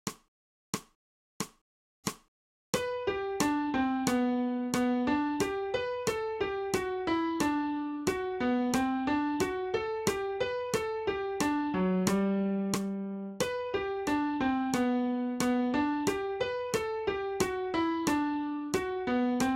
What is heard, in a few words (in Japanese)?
新フレットレス